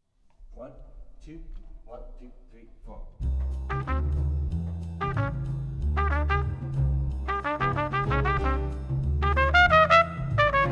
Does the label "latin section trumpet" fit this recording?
Yes